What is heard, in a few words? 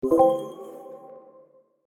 unmute sound; discord